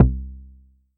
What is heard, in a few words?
Tempest 808 Style Kick, Drum Machines; 300 Drum Machines; Dave Smith Instruments